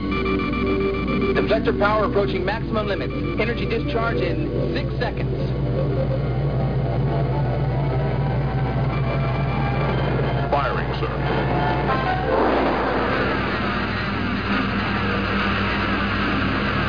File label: dish